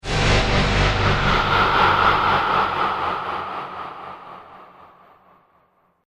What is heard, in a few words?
Demonic Voice Impact, Monster Sound Effects